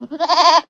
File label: Goat Bleat. Single Short Bleat. Close Perspective.